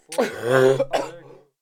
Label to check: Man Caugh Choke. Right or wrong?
Right